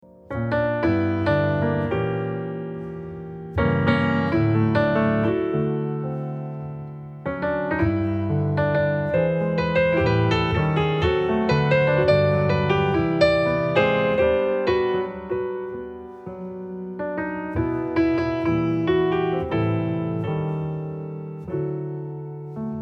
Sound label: solo piano